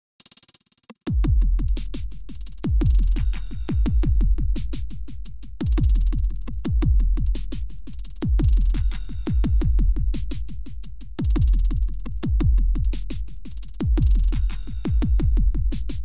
DirtyDelay Moody; Metric Halo v4; Drumazone2

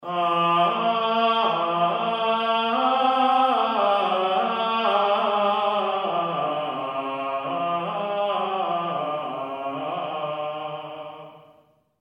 Gregorian Vocal Original

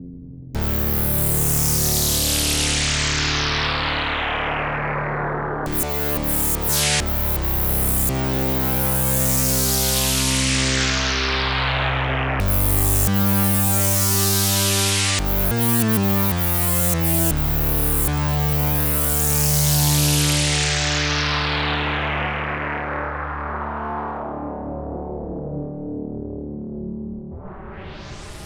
Sweep; Super Gemini

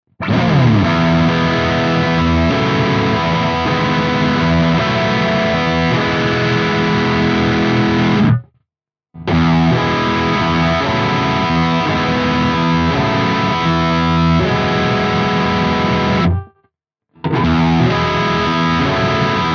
rhythm chords all positions

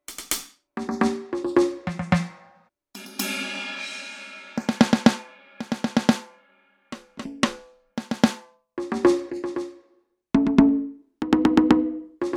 Drum Kit

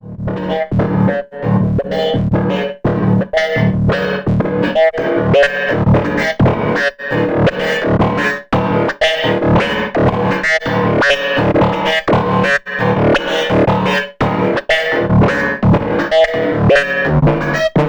Korg volca modular Ext Seq FOLD vs RATIO

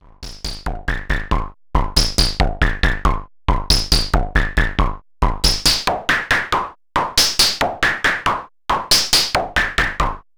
Groove; Body Synths Metal Fetishist

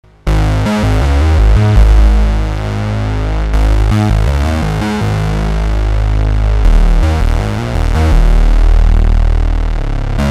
bass

